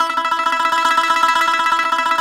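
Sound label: BGL TREM E 3, bağlama tremolo; BAGL.TREM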